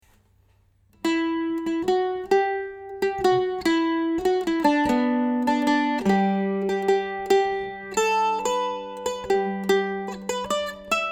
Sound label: mandolin waltz